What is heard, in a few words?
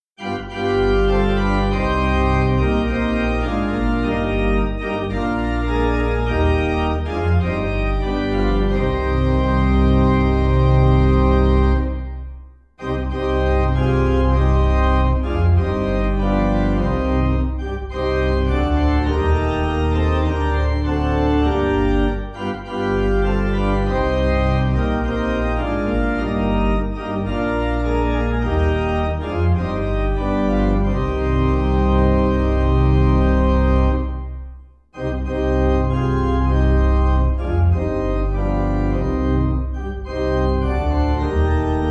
organ, hymn; adon olam; Hymnal 1982